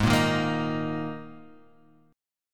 G sharp Suspended 2nd, G sharp; G#sus2 Chord {4 1 1 3 4 X}; strummed

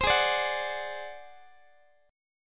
G sharp 7th Flat 5th G sharp down Guitar, chord; G#7b5; x,x,15,18,18,17; strummed

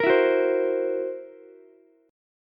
Fsharp 7th Flat 5th down Ukulele; strummed; JGuitar

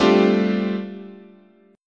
G 7th Suspended 2nd Suspended 4th, chord, guitar

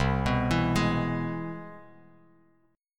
C Augmented Major 7th, Bass Standard; arpeggiated; JGuitar

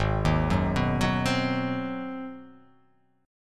G sharp 7th Sharp 9th

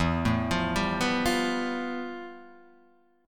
F Major Sharp 11th E

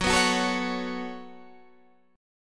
B 7th Suspended 2nd, F sharp

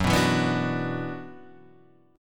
F sharp 7th Suspended 4th Sharp 5th

